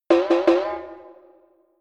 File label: notification; samsung Aleart